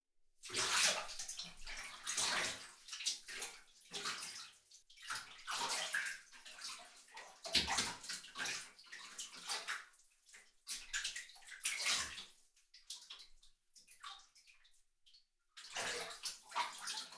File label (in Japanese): お風呂のちゃぷちゃぷ; 3DIO; お風呂に関する音